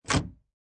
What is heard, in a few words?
Bedroom Door Handle; Doors; Miscellaneous Doors; Lynne Music